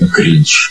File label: cringe